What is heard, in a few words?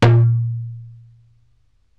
darbuka note